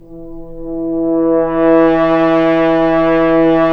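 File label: french horns, swells; Roland L-CDX-03 Disk 2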